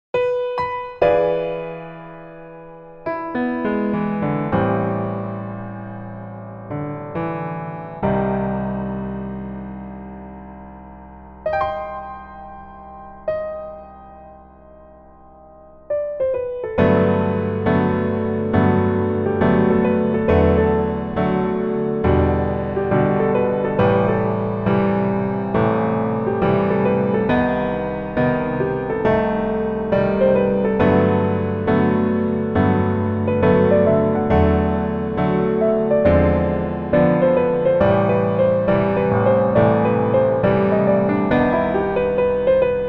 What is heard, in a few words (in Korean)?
Piano ver, 멜로디MR, 남자키